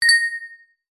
multimedia alert notification basic bell synth positive chime ring